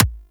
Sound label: Weapon Punch Pistol; Warframe; Weapons; Pistols